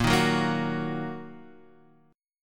Bb Suspended 4th Sharp 5th Bb down Guitar Half Step Down; Bbsus4#5 strummed